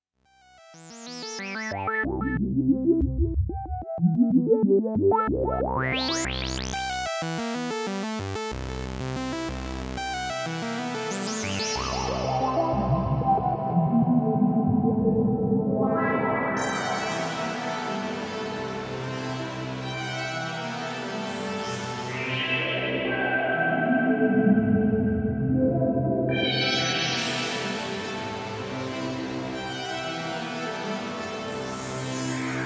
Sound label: Synthesizer Reverb Experimental Immerse MKII